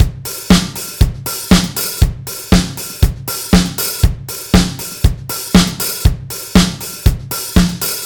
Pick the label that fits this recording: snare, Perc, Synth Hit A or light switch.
snare